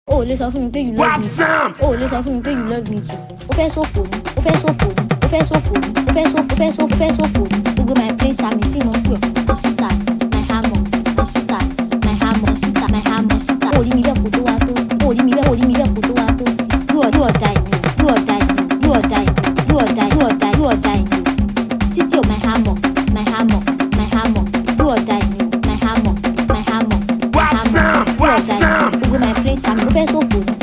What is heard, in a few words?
Beat Instrumental